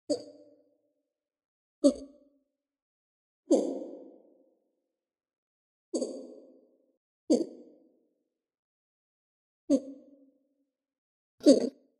Catta hmms, Lemur; a lone ringtail emitting a quavered version of the "hmm" call; The Audible Phylogeny of Lemurs

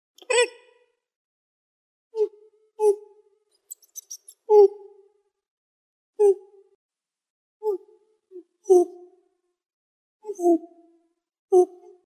Hapalemur hmms, lemur; a pair of H. griseus emit their own "hmms" and light clear calls inside a carrier; The Audible Phylogeny of Lemurs

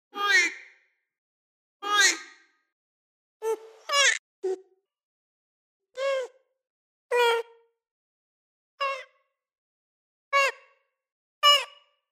Hapa clear calls various, Lemur; H. griseus; The Audible Phylogeny of Lemurs

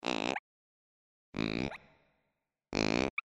E Coronatus rising grunts, Lemur; The Audible Phylogeny of Lemurs

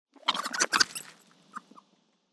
Catta defensive, Lemur; The Audible Phylogeny of Lemurs

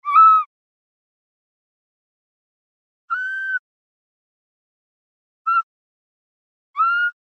E coronatus clear calls various, Lemur; The Audible Phylogeny of Lemurs

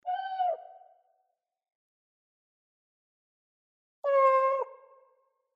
Two P coquereli contact calls, Lemur; The Audible Phylogeny of Lemurs

